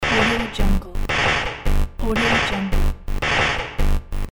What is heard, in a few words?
industrial style rhythmic loop